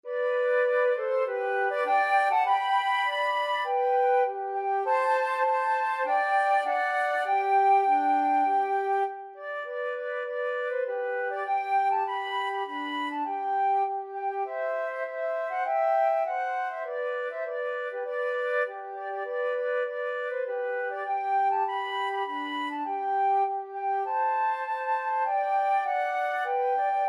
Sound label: battle hymn, flute duet